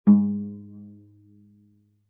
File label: Violin pizz ff sul G Ab3; MIS Pitches - 2014; Strings